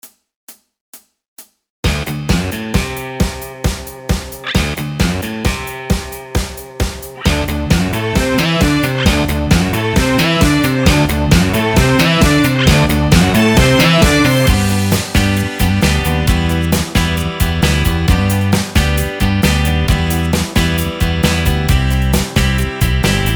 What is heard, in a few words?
Midi Yamaha; general midi